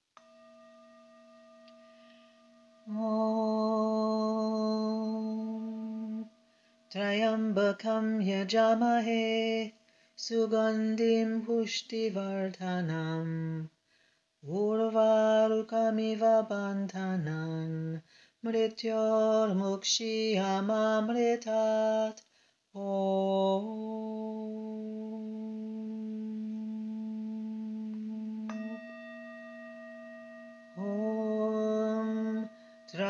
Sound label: mahamrityunjaya mantra